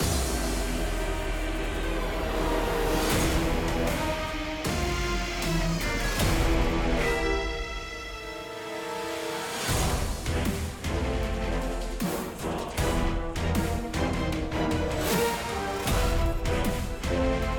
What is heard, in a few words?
Battle Demon castle